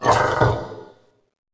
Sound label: wretch tunnels amb alert; A Machine for Pigs